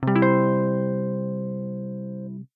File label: jingle